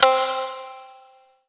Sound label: Kawai K11 Shamisen C4